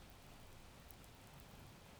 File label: pulses, BALAENOPTERA; MONACO 2022-07